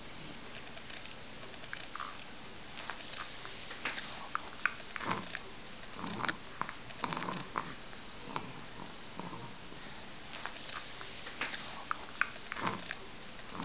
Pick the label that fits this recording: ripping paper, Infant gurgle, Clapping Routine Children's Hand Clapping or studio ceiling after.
Infant gurgle